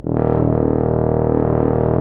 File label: TUBA; Sound & Vision - Gigapack I CD 2 (Roland); Brass&Woods2